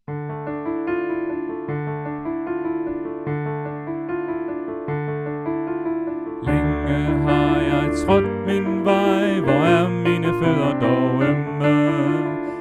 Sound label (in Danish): Sopran